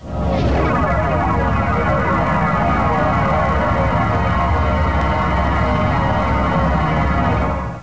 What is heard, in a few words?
tractor beam sound; Enterprise; startrek